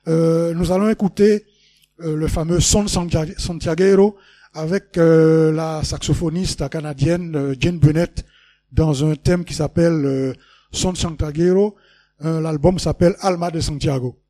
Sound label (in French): musique Son Santiaguero